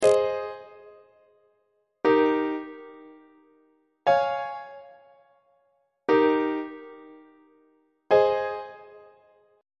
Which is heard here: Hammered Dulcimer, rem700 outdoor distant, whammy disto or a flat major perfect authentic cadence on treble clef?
a flat major perfect authentic cadence on treble clef